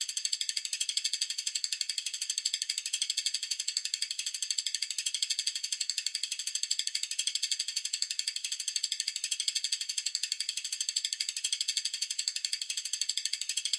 zvuk shesterenok

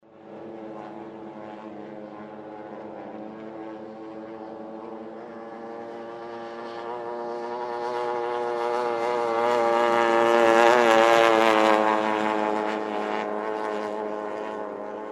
zvuk motornoj lodki

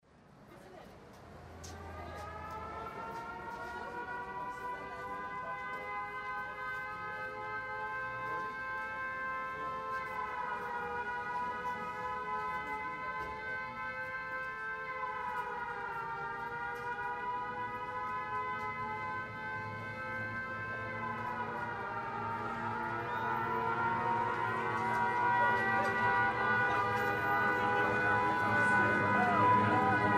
zvuki trevogi i avianaleta

